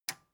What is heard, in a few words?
metal button tiny release disable